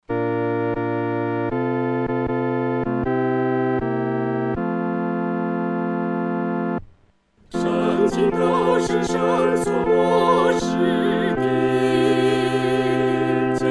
合唱 四声部

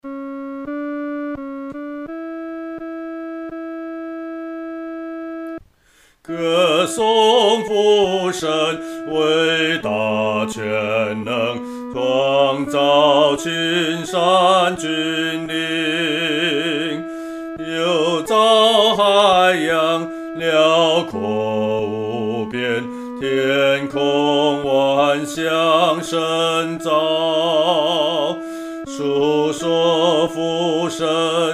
独唱 第二声

